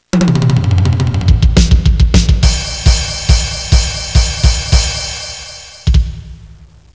drum; Sound Library 1; music

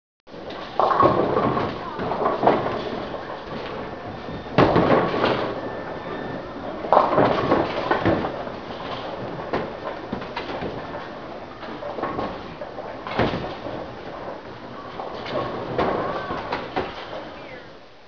bowl

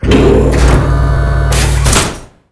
Cockpit Sequence Test; D3DEMO

